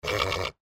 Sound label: horse snort